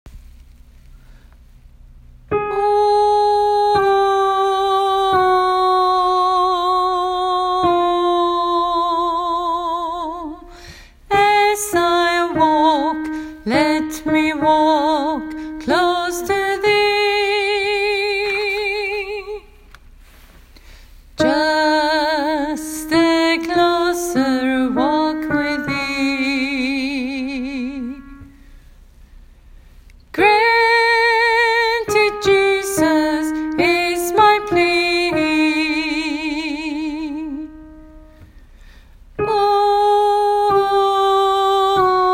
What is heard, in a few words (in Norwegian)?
just a closer walk sopran; åfjord